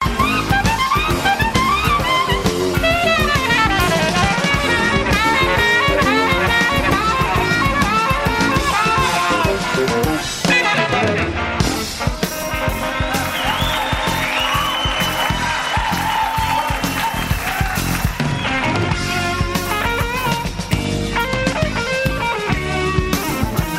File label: jazz